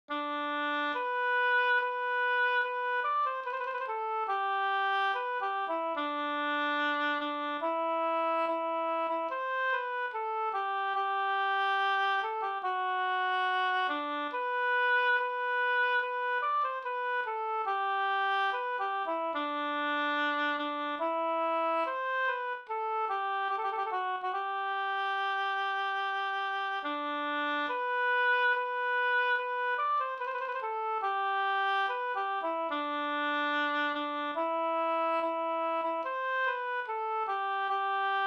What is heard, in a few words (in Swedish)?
brudmarsch Barkarby brudmarsch